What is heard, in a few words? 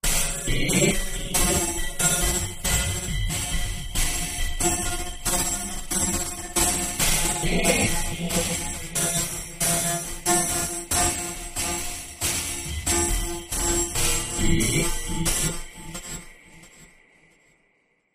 Hog Oilier Machine Rhy; musical